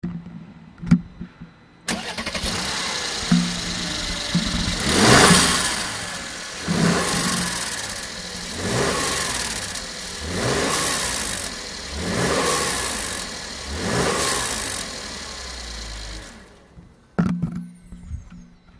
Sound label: e400 coupe; e class coupe c207; reliability